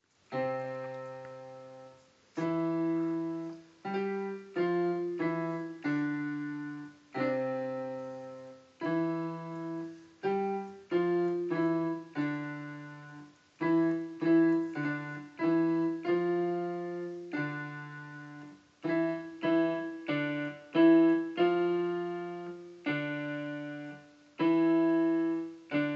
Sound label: keyboard